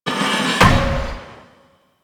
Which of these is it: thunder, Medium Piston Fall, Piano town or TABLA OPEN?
Medium Piston Fall